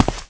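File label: walk left; The Elder Scrolls III Morrowind GOTY; XBOX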